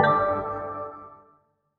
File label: Ring Ball Through; Sports; Training